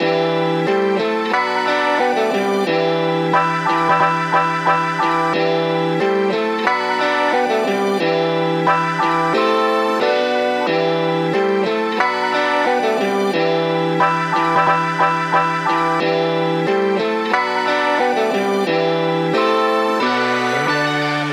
90BPM Em Guitar

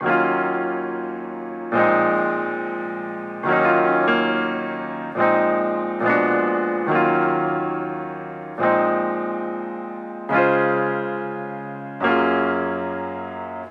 70BPM Am Piano

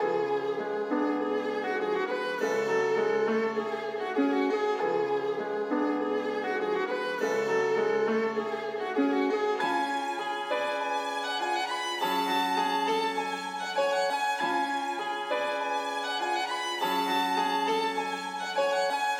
100 BPM

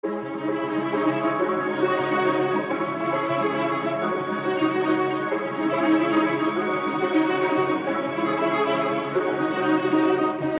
182bpm Violin

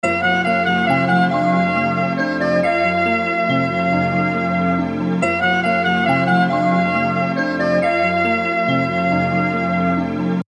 185bpm

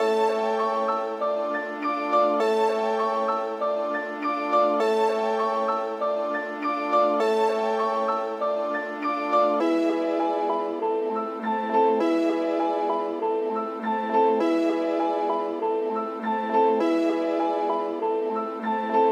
100 BPM

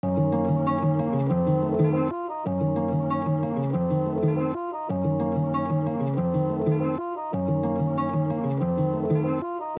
197bpm Guitar

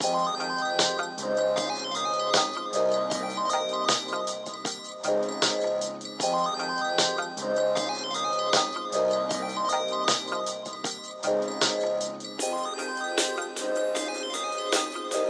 Mysterious Vibes 155 bpm

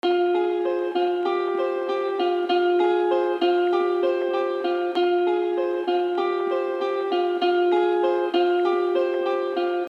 195 Guitar

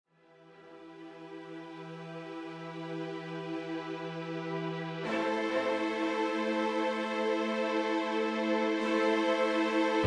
192 Bpm

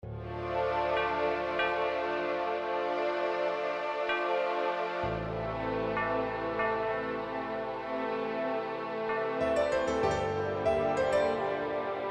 192 bpm

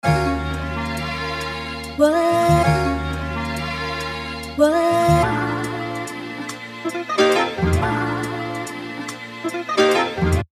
185bpm

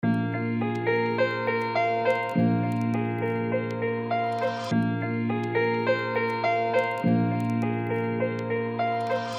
205bpm, loop

